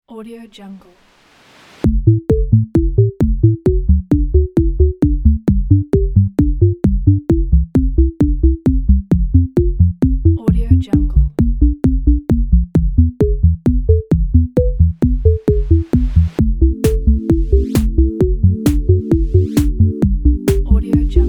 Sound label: minimal